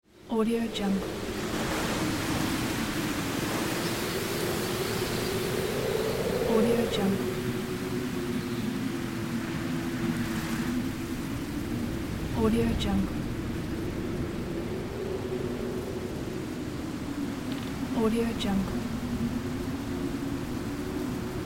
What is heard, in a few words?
wind